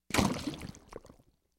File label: Thick Liquid Gurgles